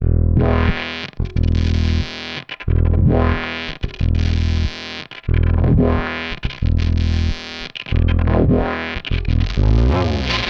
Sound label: Delay Distort, Bass; Bass X